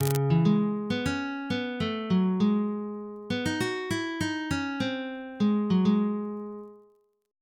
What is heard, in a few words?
melody, uke